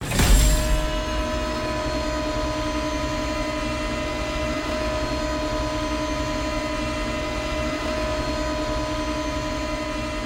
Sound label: Platform Raise Start Loop; Scraprun; Deathrun